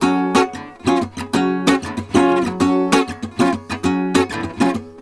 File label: GUITAR, Balkanish Traditions